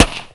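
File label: gunshot; Ensoniq ZR-76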